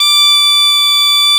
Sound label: BP MID D3; USB Soundscan vol.42 - Celtic Flavours; AKAI